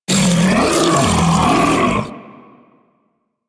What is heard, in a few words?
feast enemy pull; DE; Warframe; Warframes; Grendel